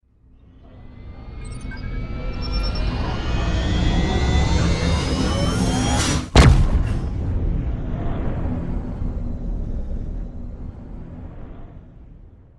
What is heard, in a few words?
warp drive sound; discovery